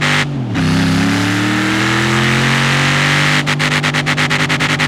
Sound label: second, vehicles